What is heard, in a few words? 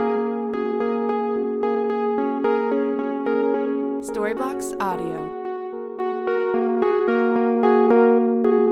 Ambient House Synth 110BPM A